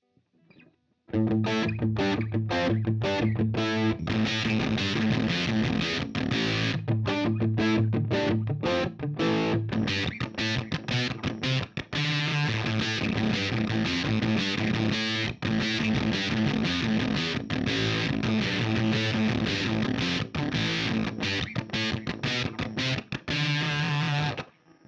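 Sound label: fuzz vintage, Filter Fuzz Effektpedal; Walrus Audio Kangra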